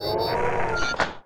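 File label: rocket launcher draw; weapons; Dystopia; rpg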